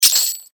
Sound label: buy item